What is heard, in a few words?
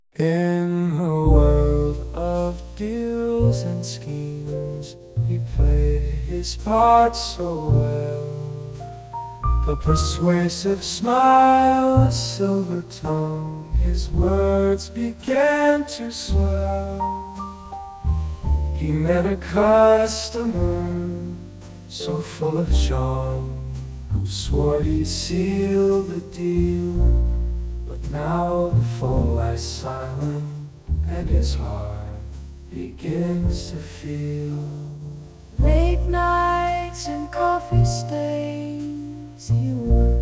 Smooth Serenade jazz